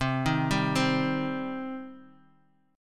C Minor C down Guitar; arpeggiated; JGuitar

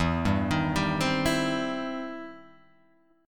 E Minor Major 7th Sharp 5th, Guitar, Open G; 2,0,1,0,1,2

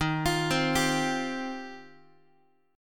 D sharp 6th Add 9th, Guitar; arpeggiated; JGuitar

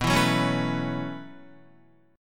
B 9th Suspended 4th down Guitar 4F,4A,5C,5G,5C,6E; B9sus4; strummed; JGuitar

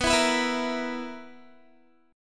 B Major 7th Suspended 4th B down Guitar; strummed

